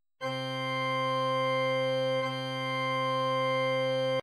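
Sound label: c organ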